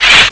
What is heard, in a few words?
scratch; ST-XX Amiga Soundtracker Sample Packs